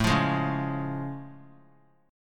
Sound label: A Augmented Major 7th A; strummed; 21,24,23,23; JGuitar